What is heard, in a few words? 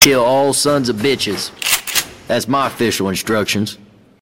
Sobs; Left 4 Dead 2